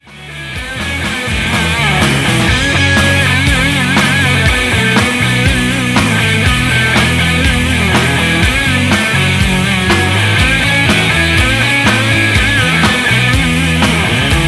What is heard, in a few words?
Instrumental